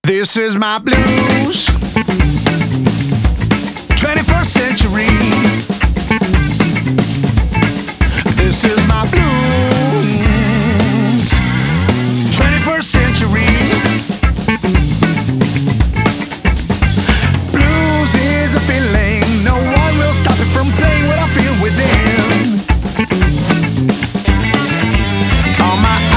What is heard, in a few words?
Blues Is Air